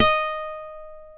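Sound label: D#4 FLAMENCO, FLAMENCO guitar; WorldSounds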